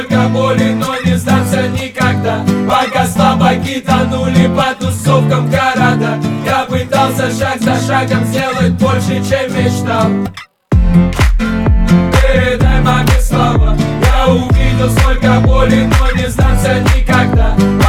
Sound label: Acoustic Version